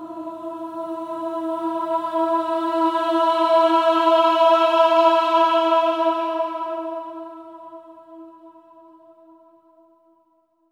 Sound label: AH E4, swell ahs, Symphony of Voices; Spectrasonics